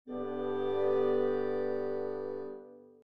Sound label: woodwind threes; Nikolai Rimsky Korsakov